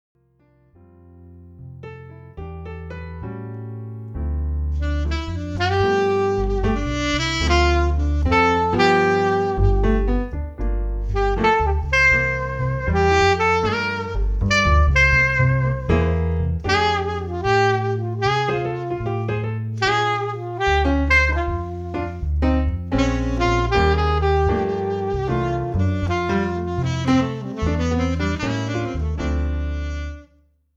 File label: Keyboard and Sax Jazz